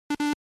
Arcade Shooter Score

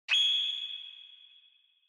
Flash Low; Onoma

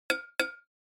Onoma Surprise Low

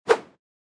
Motion Swish

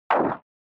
SNES Shooter Damage

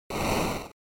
Noise Phrase, NES; NES-General

